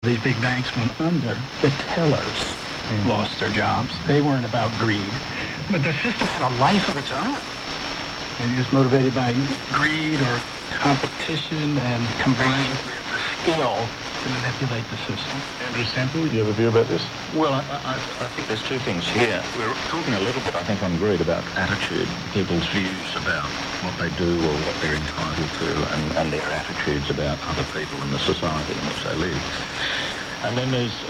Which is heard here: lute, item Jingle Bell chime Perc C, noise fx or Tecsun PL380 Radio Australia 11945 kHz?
Tecsun PL380 Radio Australia 11945 kHz